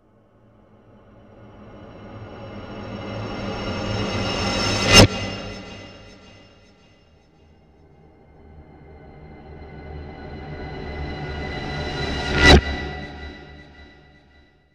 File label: Reverse Stinger, Horror; Sound Design